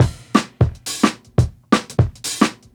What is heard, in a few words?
DNB BREAK